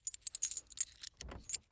Coins Multiple Coins Jingle In Hand; Foley

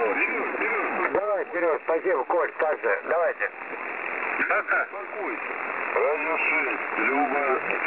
websdr recording 3660.0kHz

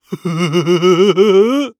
Cartoon Male Dumb Giggle

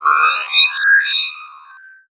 Multimedia Internet CD-Rom Flash Hi-Tech

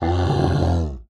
Animal Mammal Carnivore Grizzly Bear Growl; animals; AdobeCC

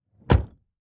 Chevy Trailblazer Door Close Interior; transportation

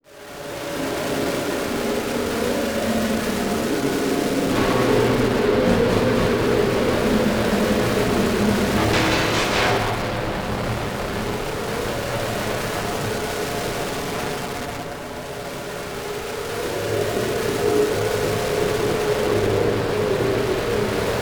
Distortion Random Static Pulses Clicks; Production Element Title Imaging

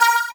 Flash Beep Mechanical; Multimedia Internet CD-Rom Flash

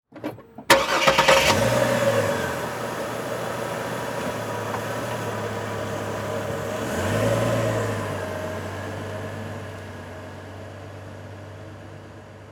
Transportation Truck Envoy Start Drive Off Slowly

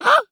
Animal Mammal Carnivore Dog Bull Terrier Bark; animals; AdobeCC

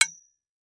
Impact Cement Hit By Copper Pipe; Impacts

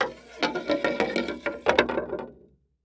Impact Wood Board Drag On Boardwalk

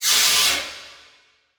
Transition Whoosh Laser; Production Element; Title Transition; AdobeCC

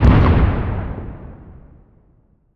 Explosion Distant Bomb; Fire and Explosions

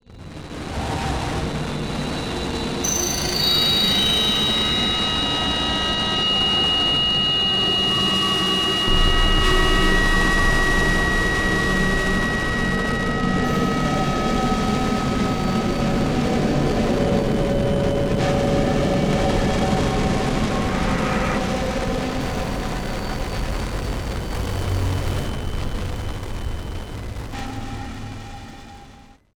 Distortion Static Spooky; Module Production Element Title Imaging; Imaging Elements

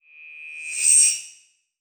Multimedia Internet CD-Rom Flash Wipe Electronic

